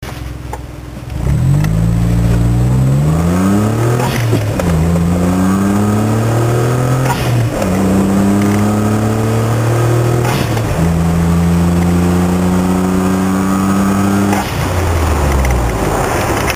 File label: blowoff